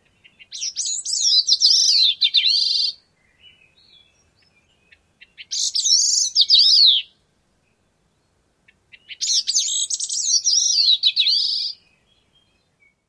song

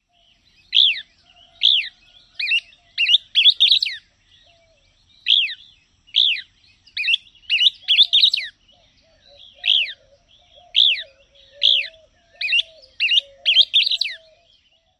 dawn song

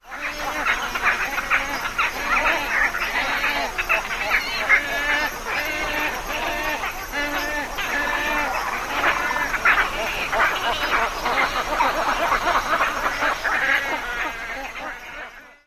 colony sounds

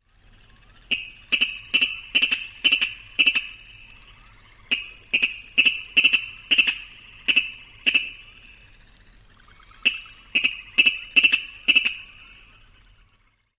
kiddick call

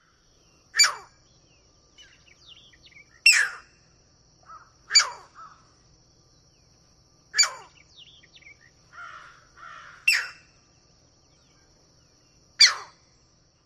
skeow calls

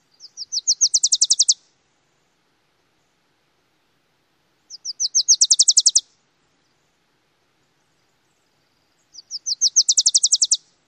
songs, WILSWA

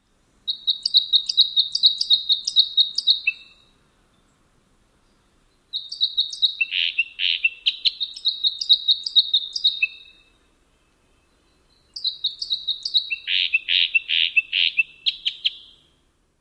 songs; NY

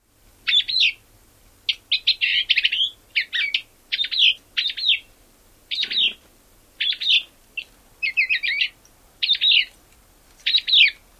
odd song

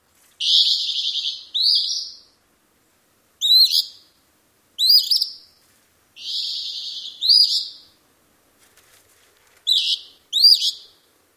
courtship calls amp songs

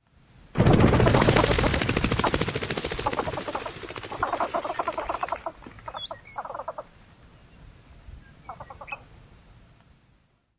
flight with calls

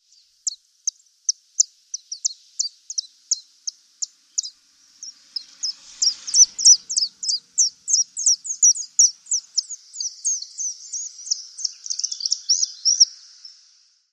calls

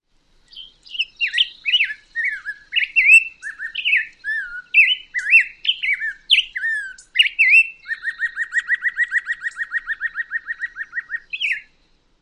song

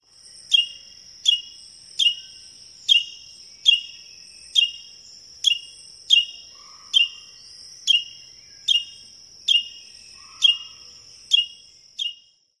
tdink

